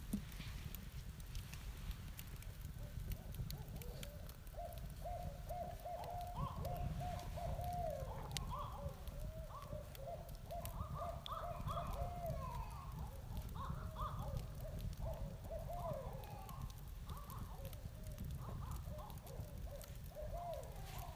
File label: Bard Owls